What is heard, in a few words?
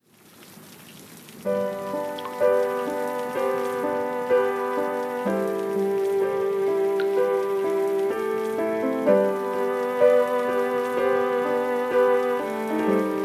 Rain; ZTE; Nubia Red Magic 8 Pro Plus